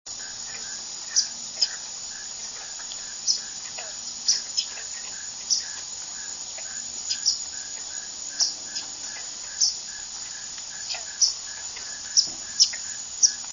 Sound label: sparrow swamp chit